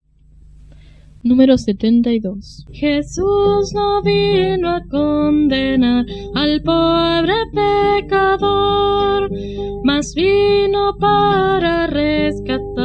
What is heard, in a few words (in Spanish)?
Coro, himnario niños